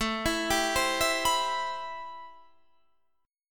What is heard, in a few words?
A-Minor 9th A